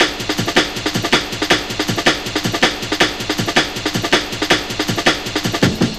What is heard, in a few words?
160, Drum Loops; Total Drum&Bass